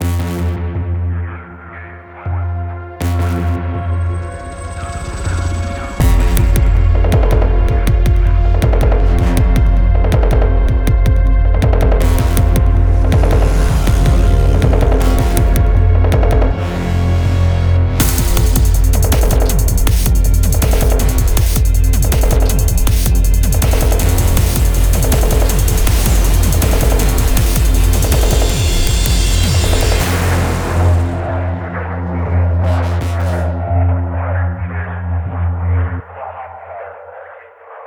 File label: Riverside Music Loading; Battlefield 3